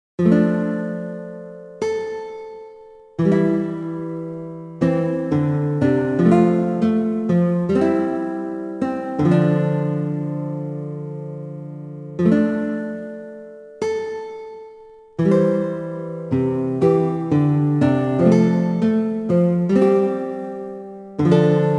melancholy healer; dreamscape gamerip

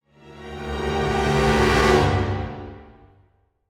MUSIC Command Beacon Fail; SideStory

